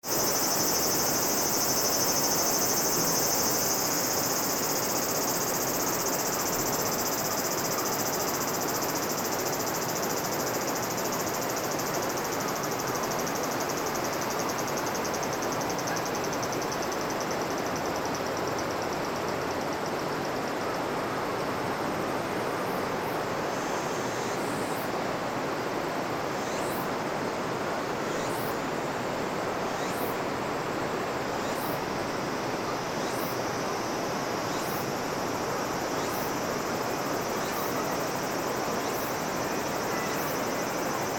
river playing summer

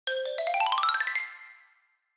xylophone

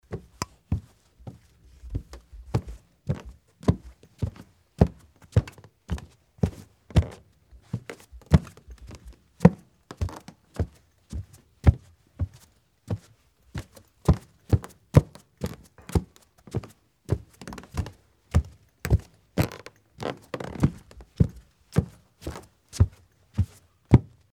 wood corridor

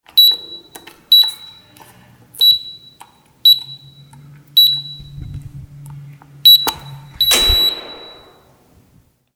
electronic key

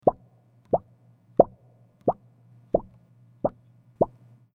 plastic sheet

